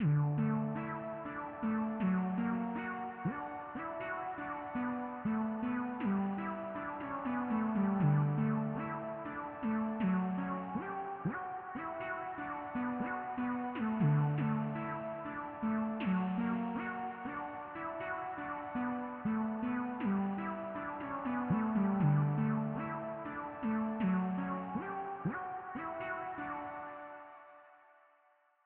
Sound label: Superstitious Melody Synth